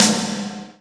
drum hit finish

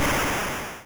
8bit crash

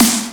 Fill Reverb

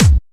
bass

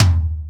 normal hit whistle

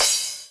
drum hit finish

